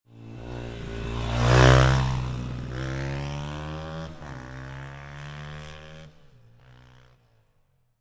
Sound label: Motorcycle Dirt Bike Drive By Center; Motorcycle Sound Effects; Motorcycle motor. Motorbike type offroad Dirt Bike, motocross